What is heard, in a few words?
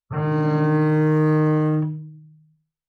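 Bass arco fortissimo sul A Eb3, Double Bass; MIS Pitches - 2014; Strings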